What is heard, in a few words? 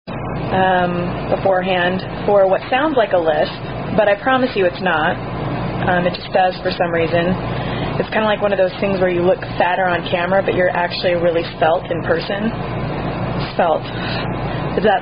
Diarrhea Song; Serious Symptom